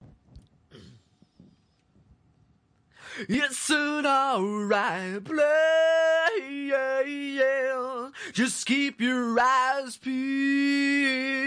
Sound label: male vocal, acapella, 180 bpm; vocal acapellas